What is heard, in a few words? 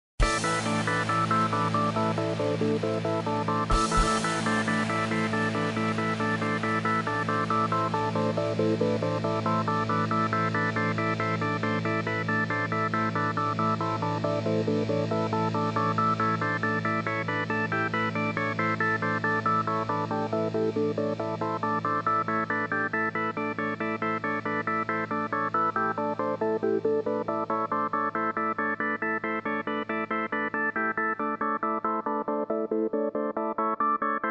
Arp